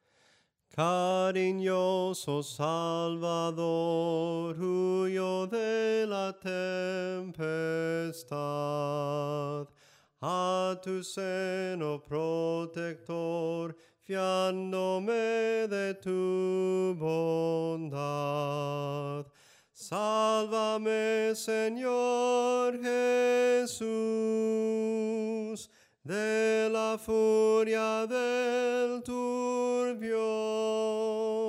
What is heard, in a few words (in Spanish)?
SOPRANO CANTADO, himnos; CARINOSO SALVADOR